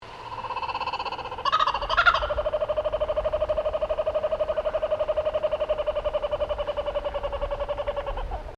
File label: Bird Kookaburra, Birds in Queensland; Birds (Sound Effects:Animals)